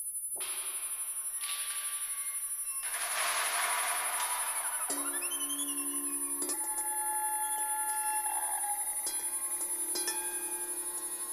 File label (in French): oscillation vibrato; Camera Oscura